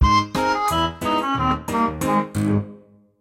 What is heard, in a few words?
Jingle Sad Small